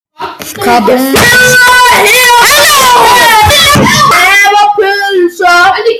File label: kaboooooooom